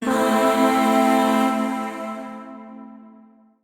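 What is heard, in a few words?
Void Shawzin Major Chord; Warframe; Zariman; Chords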